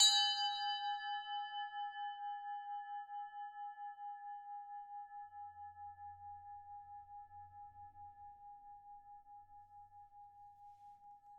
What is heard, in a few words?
Flat bell